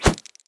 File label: dice throw; jumanji